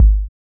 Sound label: Kick, Kickdrum; Equipped Music; Loungin House